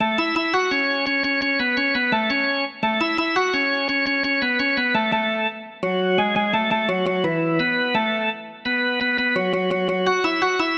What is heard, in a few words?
organ